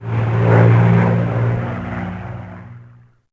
Fighter Run; Civ4 warlords

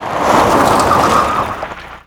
tire skid dirt short

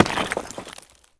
Hit Rock; Civ4 warlords

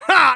taunt hah

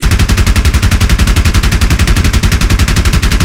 Weapons MG151; DCS

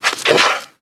dog pant

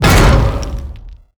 biomech land slow hard

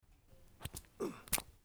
open; zvezda; Escape from Tarkov

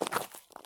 stop slate; Escape from Tarkov

